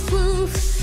woof

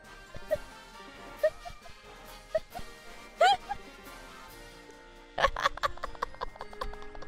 laugh

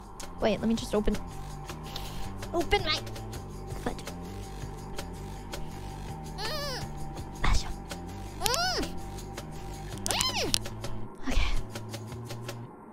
trying her best to open a bottle

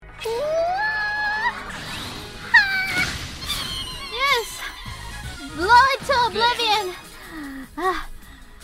uwa

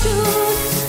toot

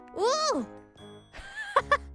Wooh

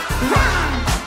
RAWR

